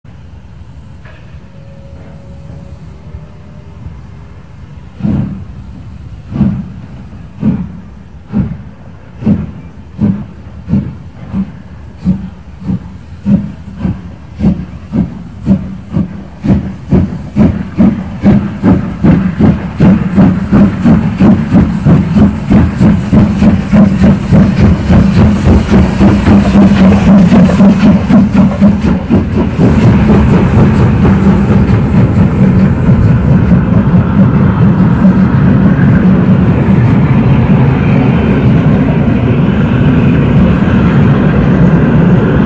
Aalen Anfahrt

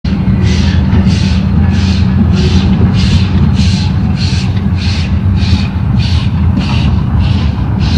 Rollen, Eisenbahn; alsdorf

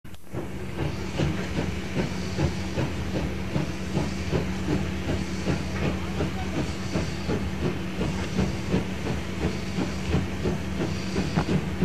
Anfahrt Lok zurueck; alsdorf

